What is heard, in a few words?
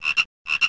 croak